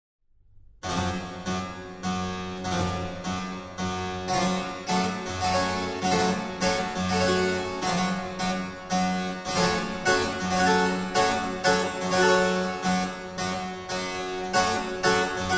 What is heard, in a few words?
praeludium g minor; house master böhm